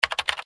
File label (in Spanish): Teclas